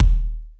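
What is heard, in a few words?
phat bass drum; bd; drums single hits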